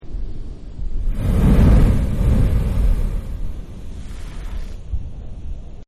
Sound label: Whale Blow Hole; Whales; Animals; Whale Blow Hole 1